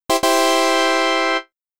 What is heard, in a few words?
Tadaa; don't call me amy; pc-98